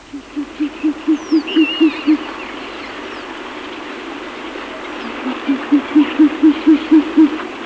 Asio flammeus

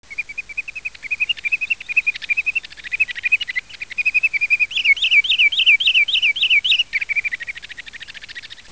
Tringa glareola

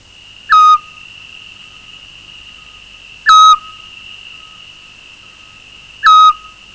Otus scops

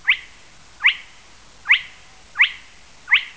Porzana porzana

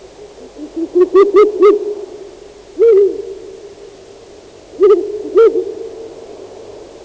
Strix uralensis

